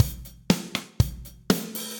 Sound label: Kit Studio Basic, drums; Standard Kits; Ableton; Session Drums Studio